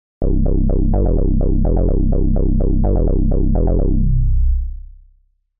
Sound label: 1osc c, moog